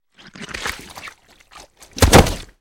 Body Falls Mud Heavy

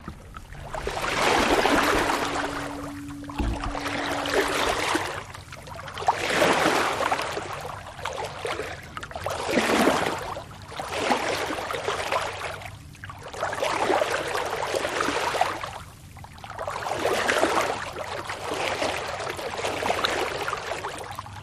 Sailboat Wake, bow wash

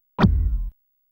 Electronic Gulps Low, servos

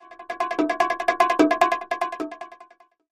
Music Trans Ethni Dr, percussion bongo

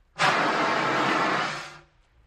Air And Steam: Chemical Fire Extinguisher Blasts

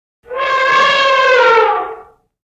Elephant Single, elephants

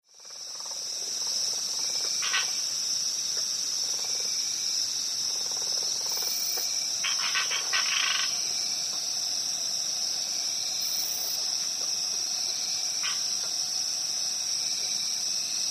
BG Crix Populous Pond, crickets

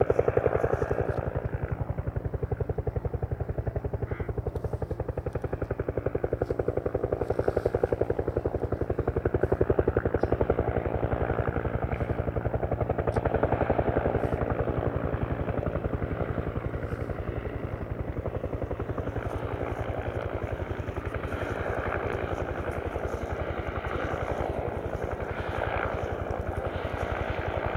Helicopter Bell 212 In, Hover; Choppy

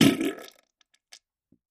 Bowl Fart Wet

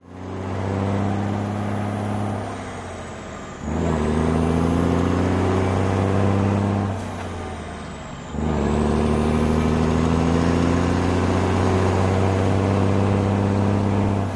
Truck Accelerate

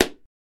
Gavel Sngl Hit

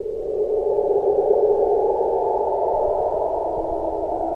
Black Magic Ambienc, drones & tones

